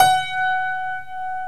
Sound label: TACK PIANO